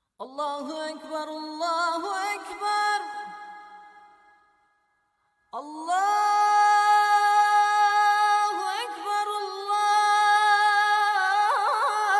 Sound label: istanbul azan